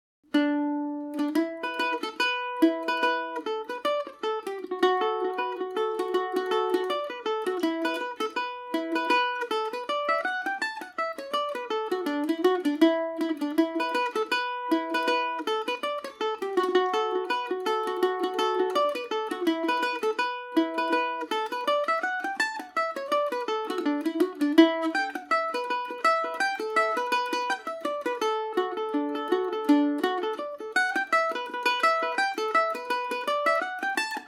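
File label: E dorian, Irish mandolin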